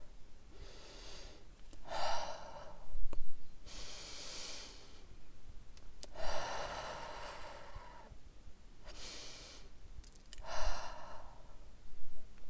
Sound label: Breathing